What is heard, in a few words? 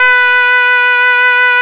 H 1, schluetter; SoundBytes - Early Patches